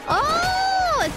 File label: oooooooooooh